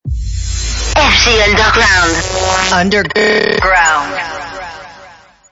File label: jingle; fgunderground